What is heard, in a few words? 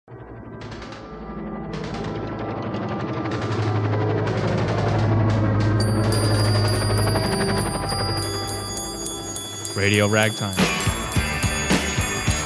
jingle